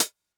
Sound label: TR-707 HH Closed; Polyend; Stazma & Concrete Collage; Concrete Collage Drum Kit; Concrete-707